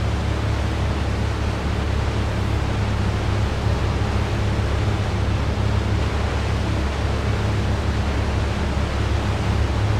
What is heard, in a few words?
Boat, iOS Sounds